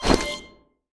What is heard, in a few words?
Cube Open; UI